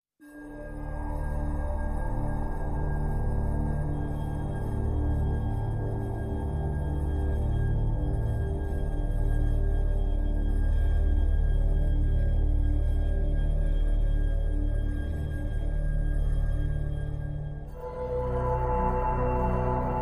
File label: Drones Only